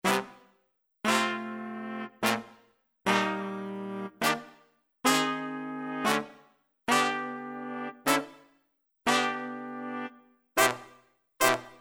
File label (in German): phrasen nu jazz